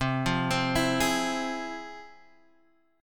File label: F Minor Major 7th, chord